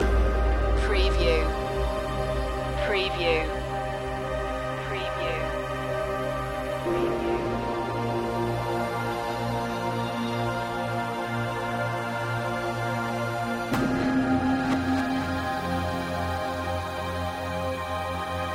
ethnic movements no vocal

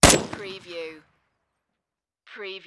Rifle Dragunov SVD

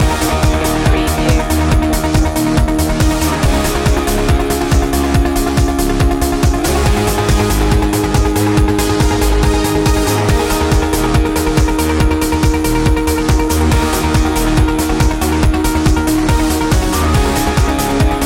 mystic loop

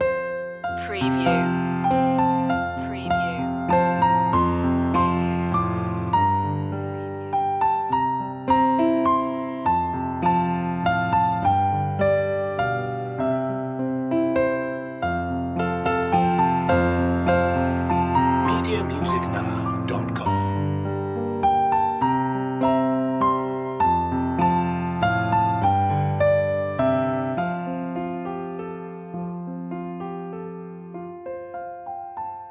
piano loop long; away in a manger; media music now

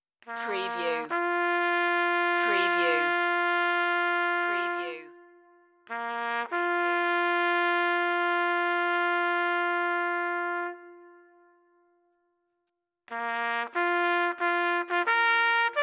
last post solo trumpet

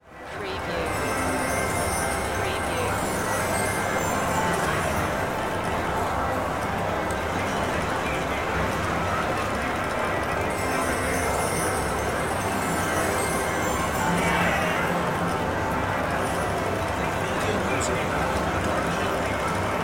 Ambience Casino

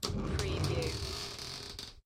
Shower Door Creak; Household Sounds / Bathroom Sounds

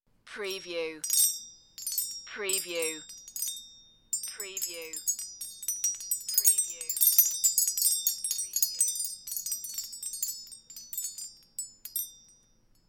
Wind Bell

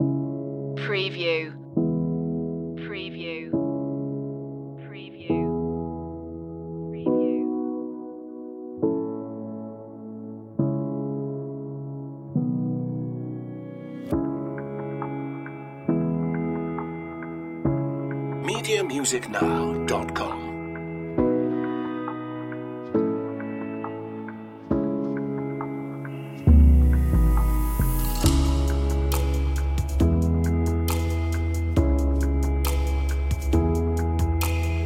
what is yet unknown no vocals less synth

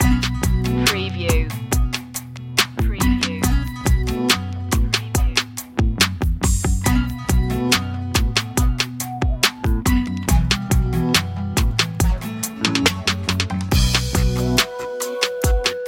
deep hip hop

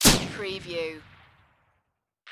Flare Gun Shot

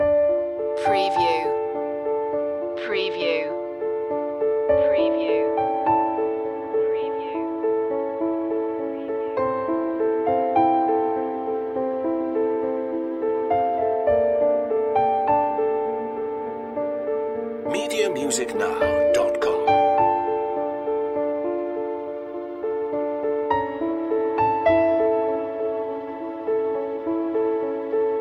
stockholm nights loop

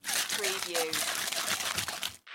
Whip Cream Can Shake; Sound Effects Bible

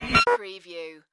Hi-tech Panel Servo Button

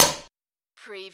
Button Sound - Metal Can Hit

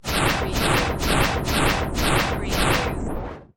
Scifi Production Element - Friction Rotate

